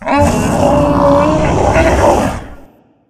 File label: Augmented Elekk Death